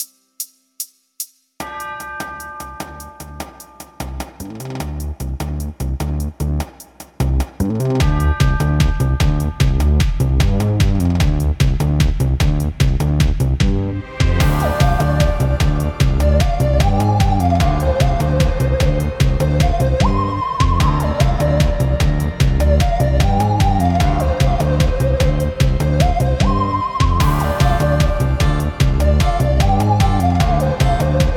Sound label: Spooky Version